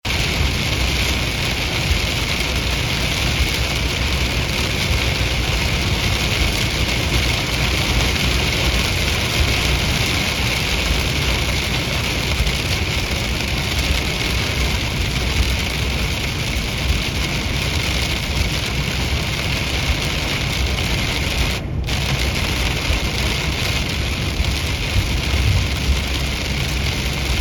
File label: rain on the highway